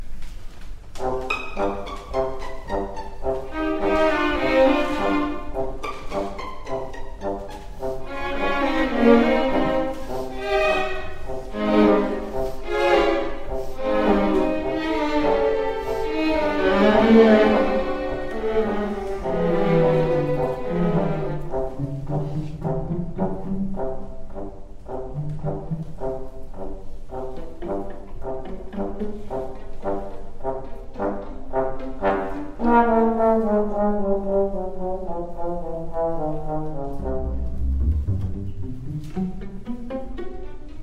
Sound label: Variations Tuba